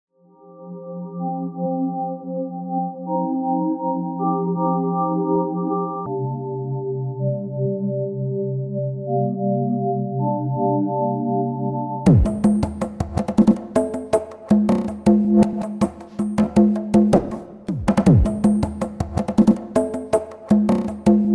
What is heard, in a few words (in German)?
Schamanische Trancemusik